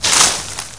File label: foliage; svencoop